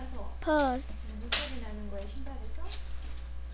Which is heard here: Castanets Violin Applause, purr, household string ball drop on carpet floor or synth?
purr